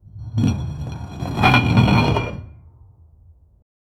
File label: the sound of a massive, stone